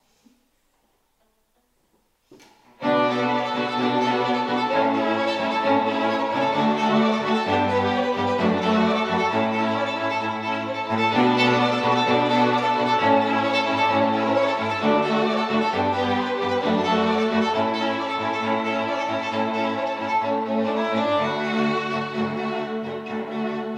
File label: strings; classical musicians